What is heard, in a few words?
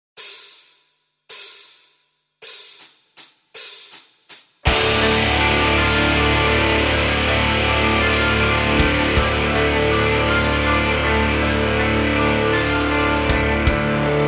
bass metal, backing tracks